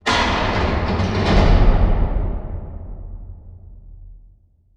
distant railroad track raise

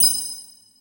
soul pickup

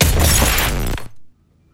tv break plus; dogma

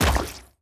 bounce large; fistula

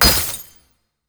freeze shatter

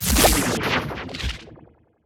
glowing hourglass fizzle

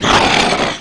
monster roar

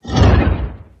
dash; maskinfamy

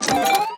portableslot prize

